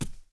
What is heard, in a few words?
Knights Templar Walk; Playable Tutorial Level